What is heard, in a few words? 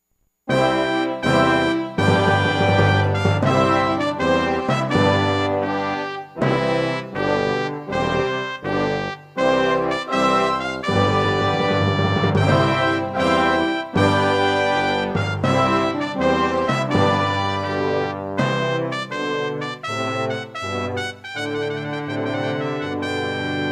Trumpet Tune; Purcell